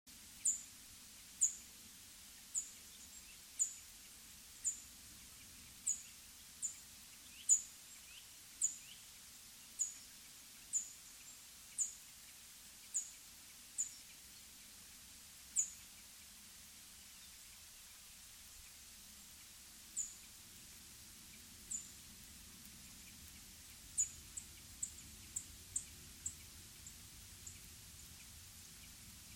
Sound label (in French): bruant zizi emberiza cirlus